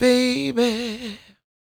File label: croon, Vocal Planet Blues Soul; Spectrasonics